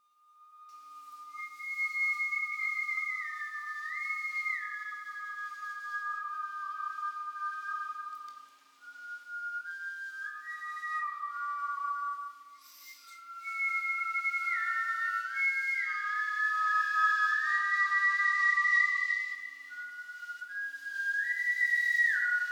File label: whistle, theremins